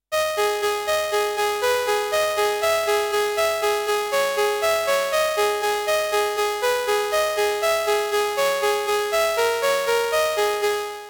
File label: rate reduced saw; Sylenth1